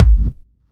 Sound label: Kick, Drum Hits